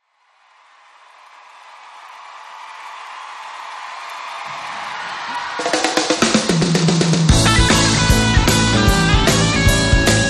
acesa ao vivo

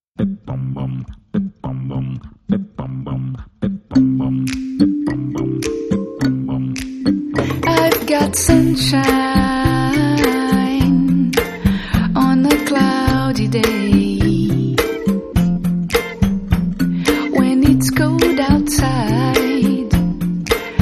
musica de brinquedo

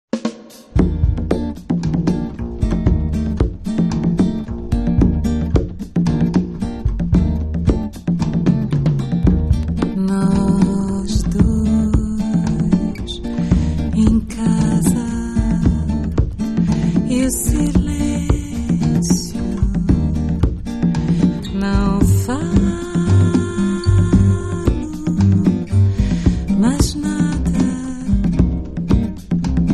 harpa bossa

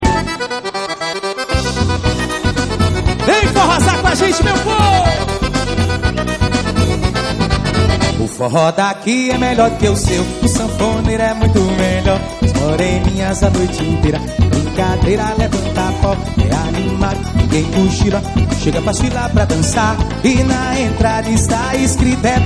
forro

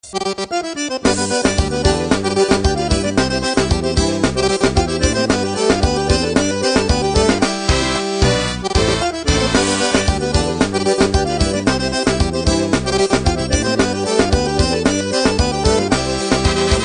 forro dance

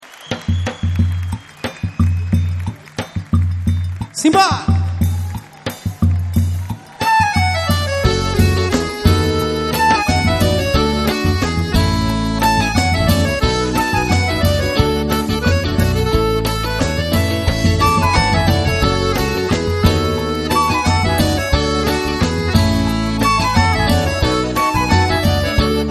forro

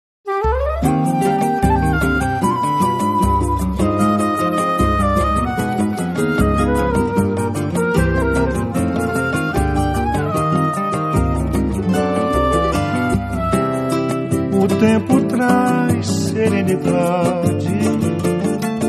samba

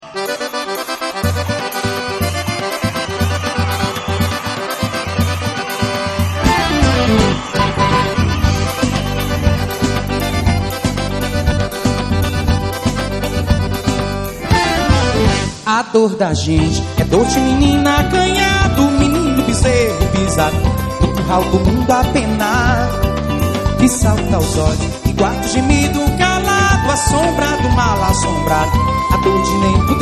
forro

